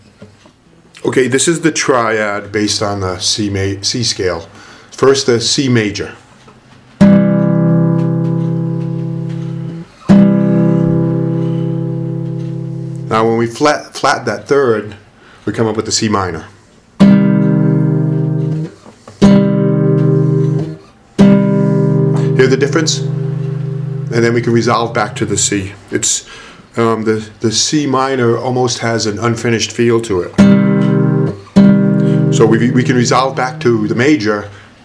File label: c major triad with c minor, classical guitar